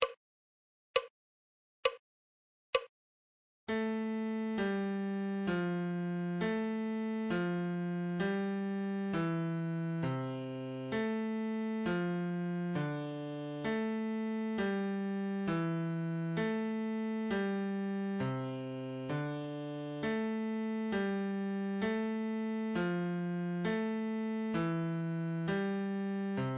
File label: musical reading in bass clef Adagio